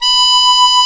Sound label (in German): MUNDHARMO VIB; Alpine Volksmusik